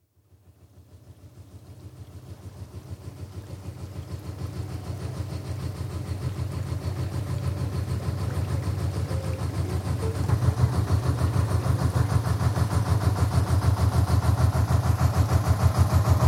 Canal Narrow Boat, pass right to left (Recorded on shore); Boats; BBC